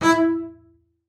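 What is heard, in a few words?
marcato E4 left, bass spiccato; Xsample VOL-5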